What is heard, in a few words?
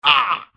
hurt